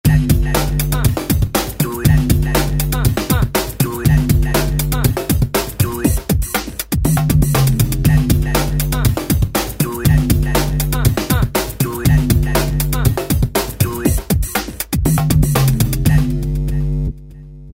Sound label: Korg ES-1 Factory Patterns; Big Red Beets